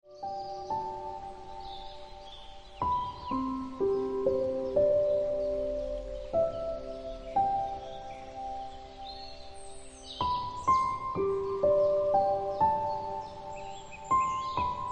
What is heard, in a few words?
Ringtone Piano Music